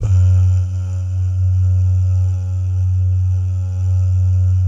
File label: "bah", Vocal Jazz Fx